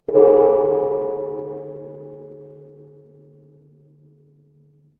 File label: instrument gong